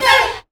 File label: Dance Mega Synth; Best Service; 90 s Sample CDs